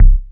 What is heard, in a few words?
Bass Drum, Cwejman S1 MK2, Drum Machines